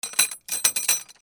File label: INDUSTRY